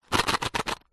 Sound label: staples box heavy duty short shake; office